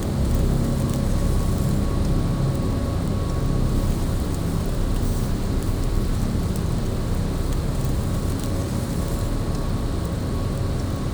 ship destroyer idle; Stellaris; toxoids; ships

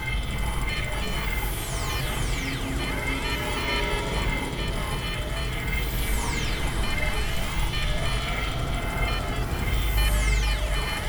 amb crisis contingency; ambient; System VFX

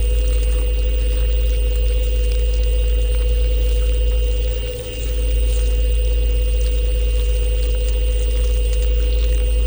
ant ship harbinger idle; biogenesis

